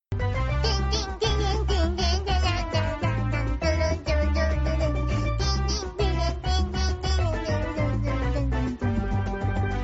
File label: singing short; sakura miko